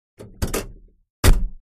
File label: Car Door Thin Boomy